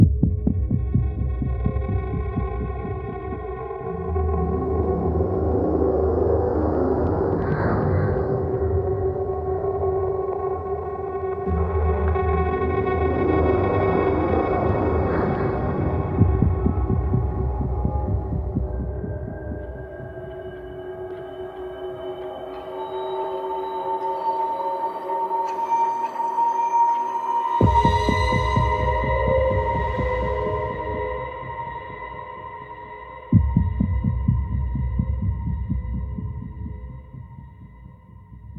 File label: Sneak; Wolfenstein The New Order gamerip 2014